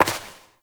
Step Dirt; Warframe; Koumei

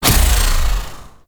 Venus Hoverboard Race Gate Hit C; Warframe; Vehicles; Hoverboard Racing

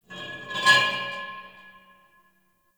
Pipe Cement Scrape; Warframe; Ambience; Nineteen Ninety Nine

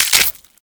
Modular Clip Small Mech, Guns; SU Modular Guns

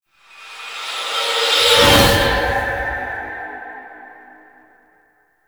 Transition Out; Zariman; Warframe; Duviri

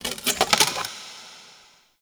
Terrarium Glass Stress; Warframe; Ambience; Entrati

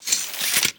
Hi Tech Clicks; Cave; Ambience; Warframe; Stalker

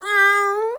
Kitten Meow Playful; Enemies; Warframe; Catbrow

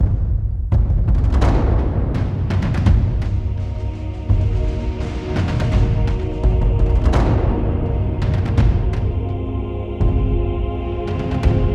Pact Choice Combat; New Player

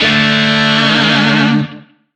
Shawzin Chromatic Chord C; Warframe; Lizzie Shawzin; Chords

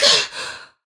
Pain; Warframe; Tau; Dax; Ninja

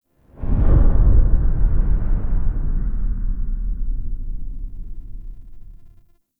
Rumbling Stinger; Ambience; Gameplay; Warframe; Entrati

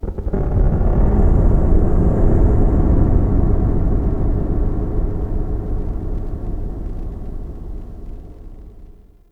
Dark Texture; Ambience; Warframe; Shadowgrapher

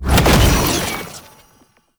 Lunge Cast; Warframe; Oraxia

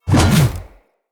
Spear Spin Whoosh; Weapons; Enemy; Nimrod Spear

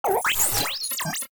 Lasrian Tank Airburst Chirp Spawn; Weapons; Warframe

